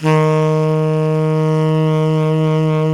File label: Tenor Soft D#3, tenor sax